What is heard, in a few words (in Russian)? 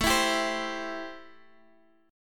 Boj Am7